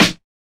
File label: Snares